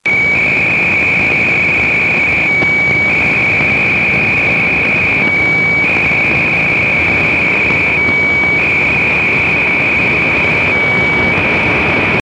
Differential GPS Phase Shift Keying

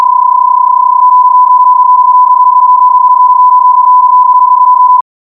BPSK-31 Mode

